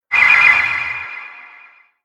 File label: Skill Aura curse